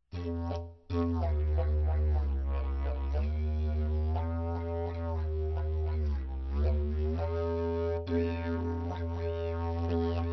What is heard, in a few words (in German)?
Posaunendidgeridoo